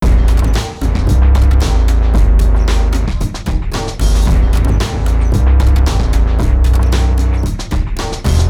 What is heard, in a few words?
Low Health Groove; FMOD Example